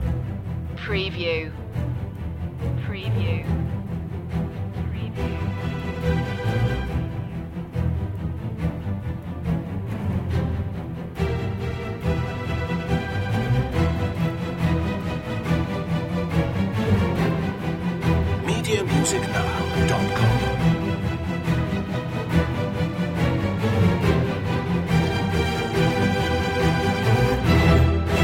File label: strings only